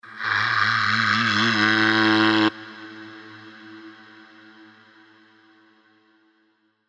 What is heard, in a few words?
Exhale One Shot